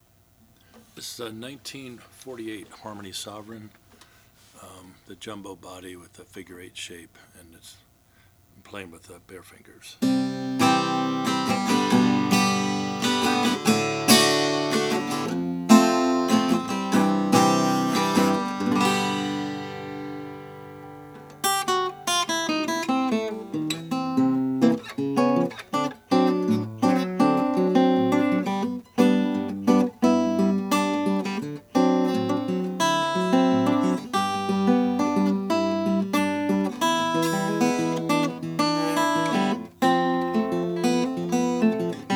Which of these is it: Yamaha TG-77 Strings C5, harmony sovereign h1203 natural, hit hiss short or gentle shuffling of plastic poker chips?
harmony sovereign h1203 natural